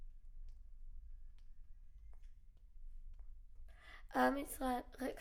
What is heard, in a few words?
Children Choir; Studio Bekol Children Choir